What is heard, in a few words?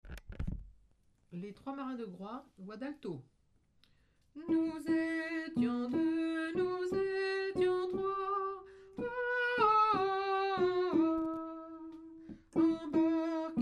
alto; les 3 marins